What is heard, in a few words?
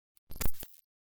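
signal glitch; افکت صوتی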